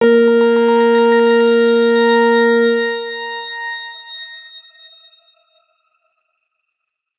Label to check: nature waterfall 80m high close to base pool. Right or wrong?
Wrong